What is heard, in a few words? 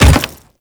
Step Default; Warframe; Grineer Leto